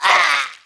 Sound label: frost alf female attack; DAoC